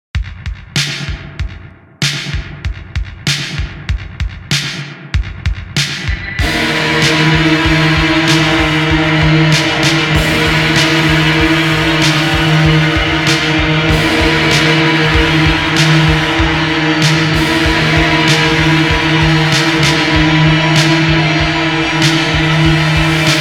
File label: Drone Solo